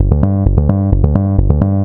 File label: bassline